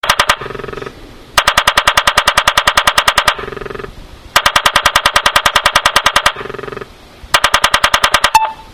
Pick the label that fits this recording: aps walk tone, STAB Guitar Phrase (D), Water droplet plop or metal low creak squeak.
aps walk tone